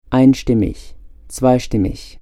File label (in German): einstimmig